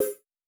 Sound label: Option Change; ichni; Wwise